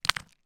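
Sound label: stone medium place down on ground; foley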